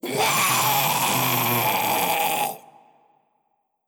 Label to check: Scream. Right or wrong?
Right